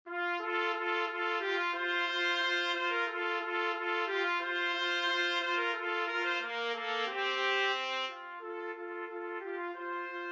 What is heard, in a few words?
vivaldi spring 2 trumpet